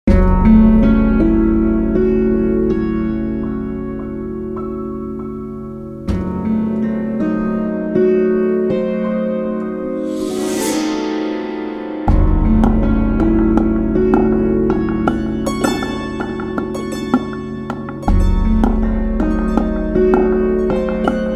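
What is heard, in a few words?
eerie surprise, synclavier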